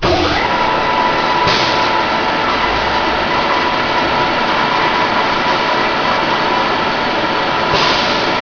big move

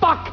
player grate

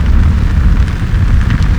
sship burn

